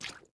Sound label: fs water, footsteps